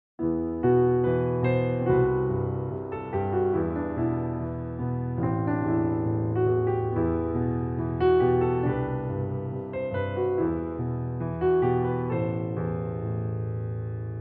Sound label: phrygian slow